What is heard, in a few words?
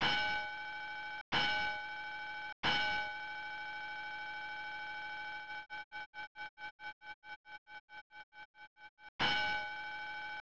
Clanging; super battleship; snes